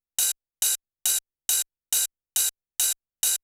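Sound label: open hi-hat, Techno 138 BPM; Best Service ProSamples vol.54; AKAI; HI ENERGY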